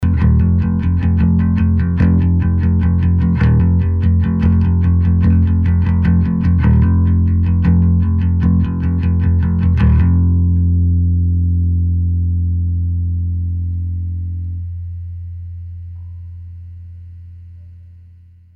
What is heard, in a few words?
bass